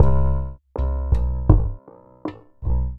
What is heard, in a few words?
Udu; Sonic Foundry (Sony Creative Software); Ma Ja Le Ethereal Textures